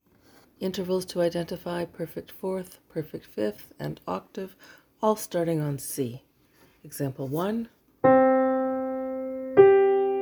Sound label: P4, P5 and P8 starting on C; Interval Identification